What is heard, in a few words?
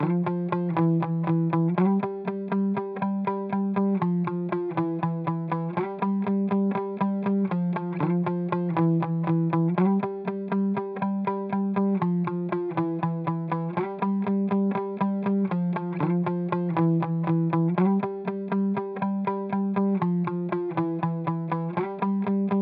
120 BPM A# Maj Muted Guitar; Cymatics; Miami Guitar Pack - Pt 1; Frail